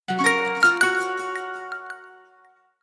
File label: notification tone; Bildirim tonu